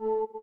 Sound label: Windows User Account Control; Glass